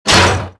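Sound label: run, footsteps; Star Wars Jedi Knight II Jedi Outcast; XBOX; mark1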